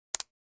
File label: Button Solid Compute